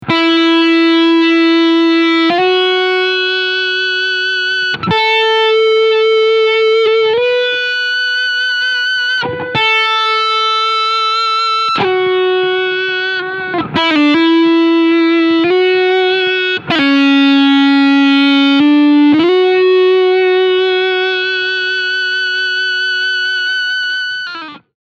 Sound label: Sustainiac Harmonics